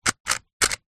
leisure radio controlled car front wheels turn electronic not moving; zapsplat